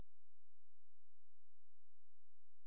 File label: silence; Ya Dunno Dick (1997); VCA Interactive